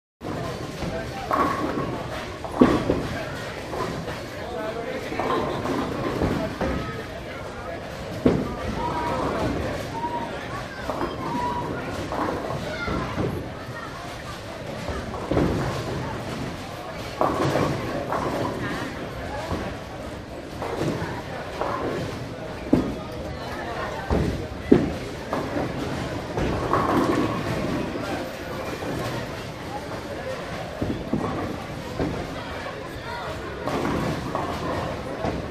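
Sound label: Bowling Alley Ambience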